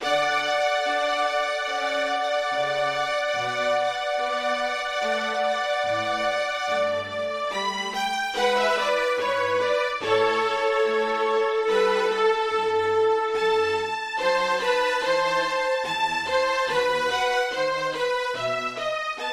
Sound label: chamber strings